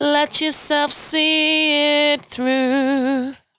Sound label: 92bpm Bb, Velvet Vocal; Zero-G